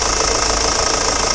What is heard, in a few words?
ford focus, Vehicles